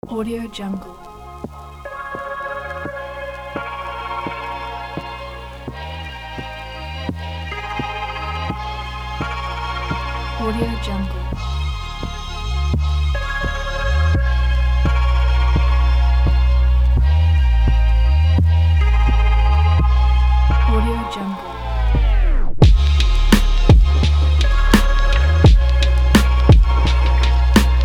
tape hip hop

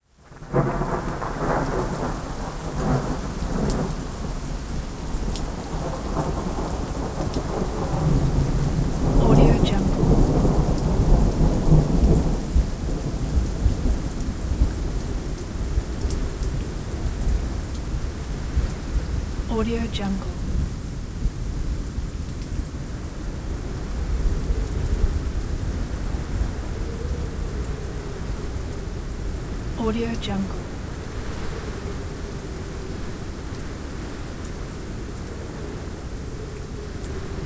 storming

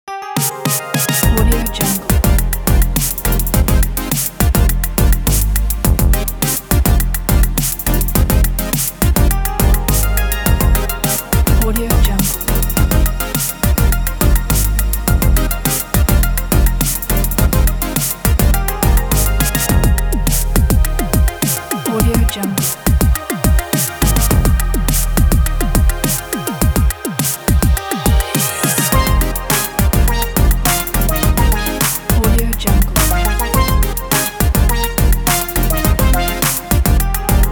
80s electro breakdance

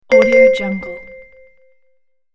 correct reward

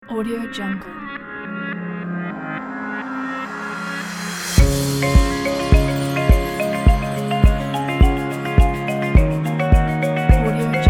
happy things inspirational music; manu-zaildaar

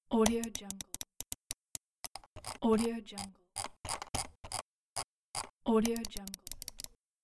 complete mouse click and scroll

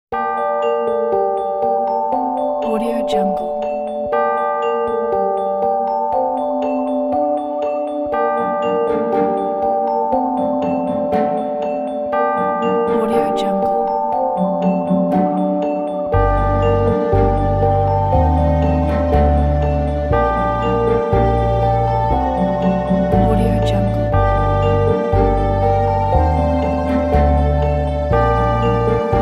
halloween midnight bells; oligarwil

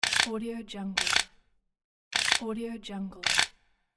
shotgun shell insert sounds; medartimus

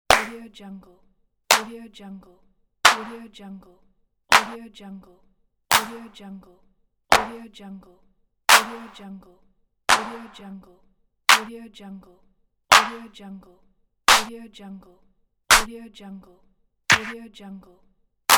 claps

